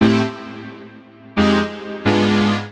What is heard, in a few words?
Brass Wave G Minor 88bpm; MIDI Clips; Music; Accompaniment; Ableton; Chop and Swing